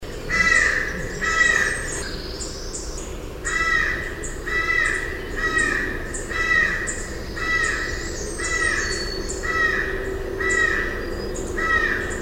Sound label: American Crow; Corvids